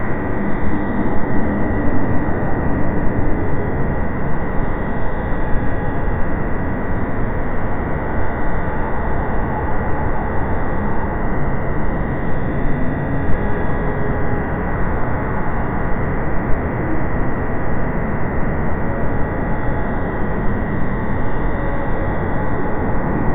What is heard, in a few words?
dystopian cyberpunk city, night